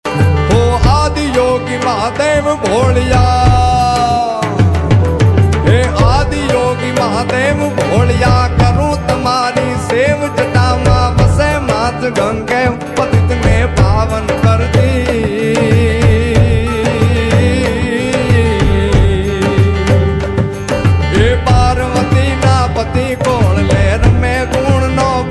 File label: gujarati ringtone